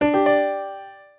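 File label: grand, Variations on a ringtone; Piano; tri-tone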